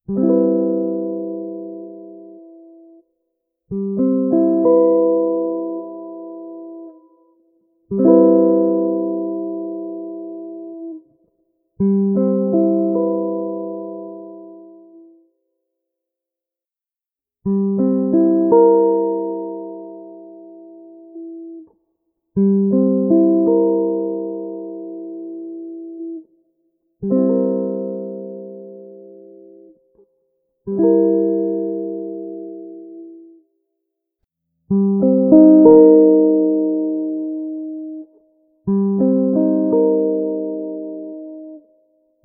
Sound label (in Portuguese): Campo Harmonico Maior; Jazz Harmonia